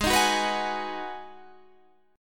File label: B 6th G sharp, guitar chord